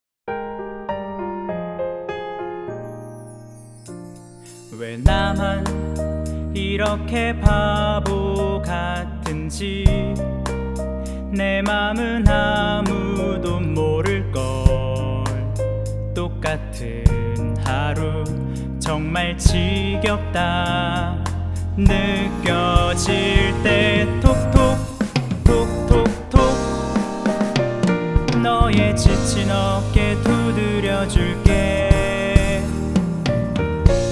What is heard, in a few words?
Tenor